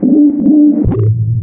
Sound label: dropship dropping pod loop; Half Life Model Pack; Half Life 2; combine gunship